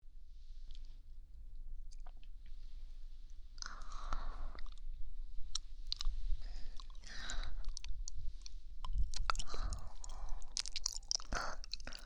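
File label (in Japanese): チョコ舐め（両耳・チョコ舐めのみ）; 2024チョコ舐め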